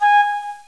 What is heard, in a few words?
pipe